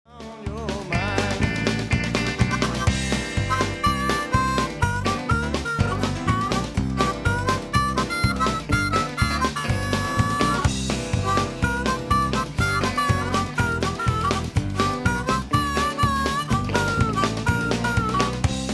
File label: harp bad